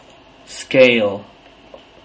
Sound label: scale